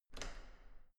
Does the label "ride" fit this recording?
No